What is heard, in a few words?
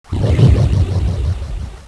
pit drone hunt; svencoop; sectore